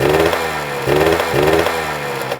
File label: jetting; cosmos